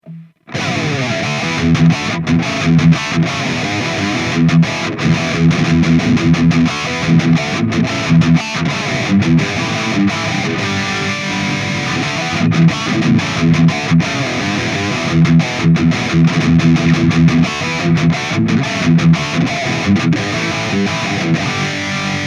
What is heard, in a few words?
Hotone Ampero One High Gain